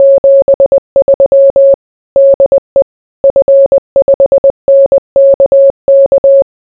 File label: morse